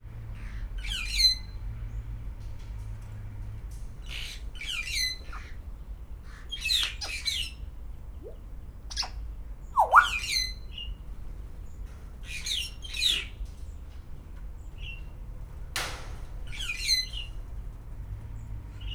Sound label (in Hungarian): finoman, jakopapagaj; szegedi zoo 2011